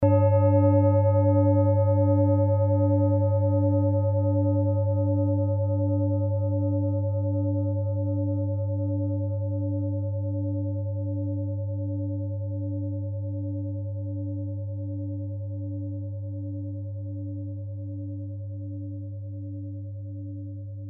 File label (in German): klangschale nepal, Klangschalen Nepal